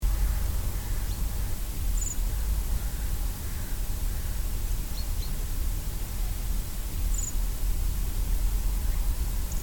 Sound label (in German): rotdross